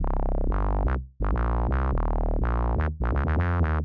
bass C minor, 125; PowerFX - Nu Jazz House

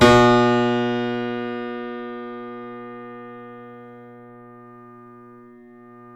C7 FF, The Ultimate Piano Collection; East West

